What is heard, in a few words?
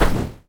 magic